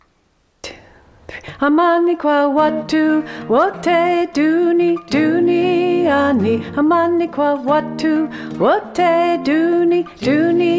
Soprano Lead, choir

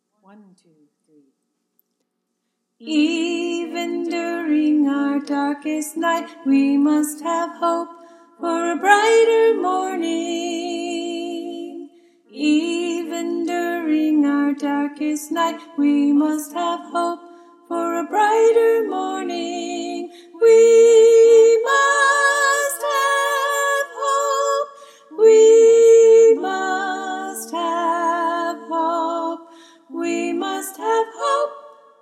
soprano, choir; freedom choir

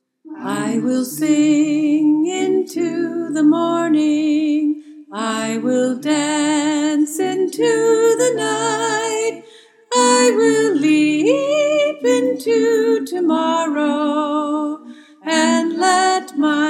Soprano, choir

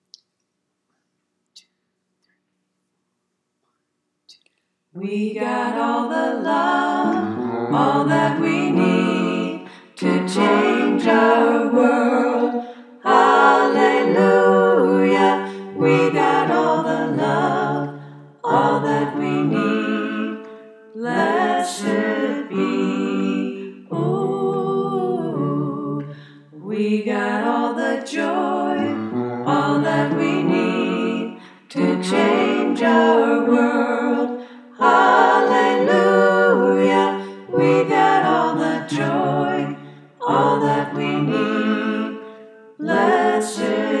all parts, choir

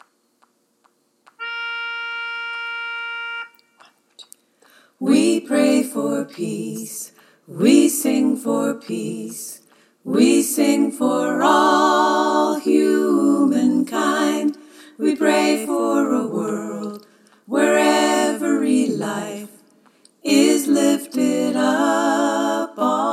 All parts, choir

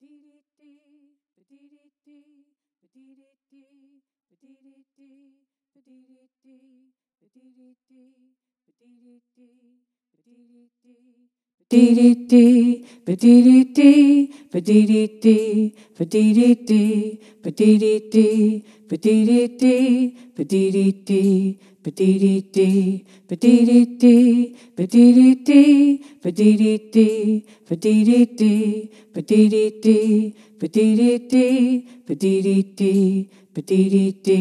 2nd bass line

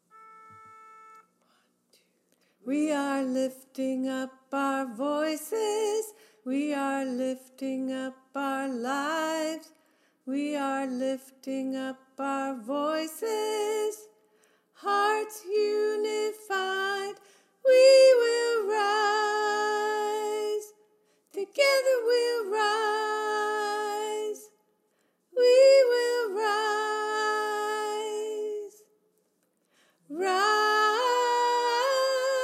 soprano; choir; The Freedom Choir

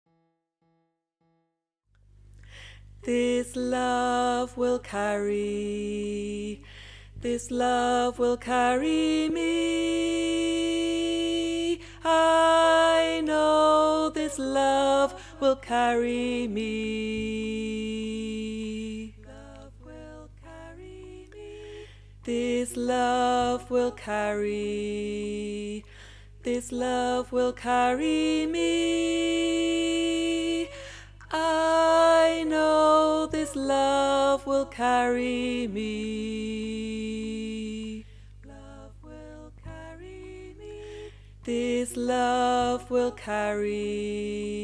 tenor, choir

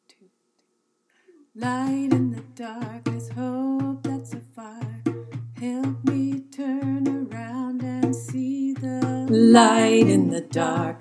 Tenor, choir; freedom choir

